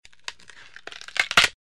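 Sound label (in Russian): хруст ломающейся ветки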